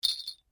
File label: SLEIGHBELLS SHORT, Cheap percussion toys; Musical; Foundation4